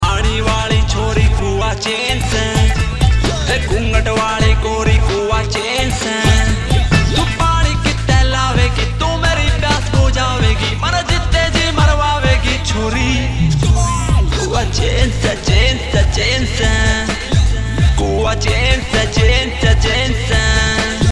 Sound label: RING TONE